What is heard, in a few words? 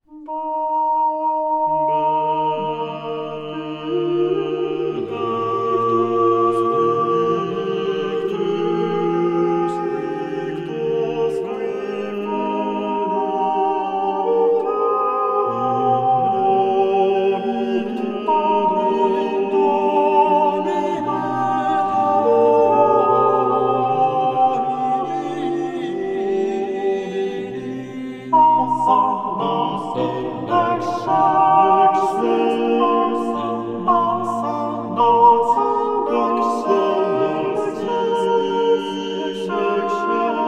Benedictus mass; Byrd